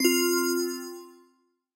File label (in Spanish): campana; Steven Universe - Beach City Drifters